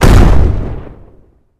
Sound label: Gun Tank Cannon Turret Shot